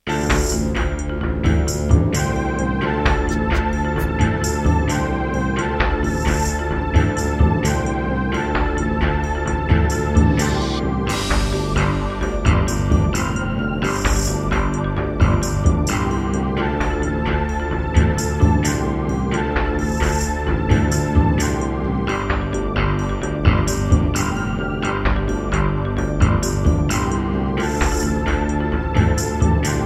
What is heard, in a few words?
Akai SG01k; Heretic